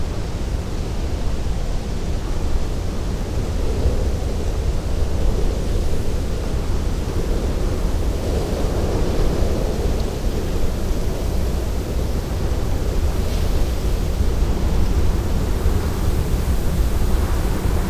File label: Desert Wind